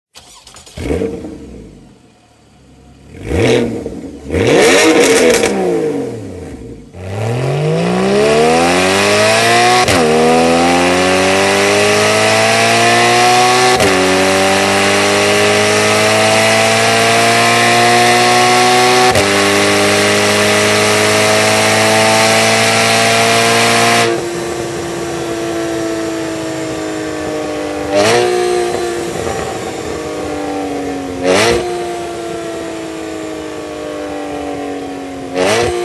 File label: Slip On Exhaust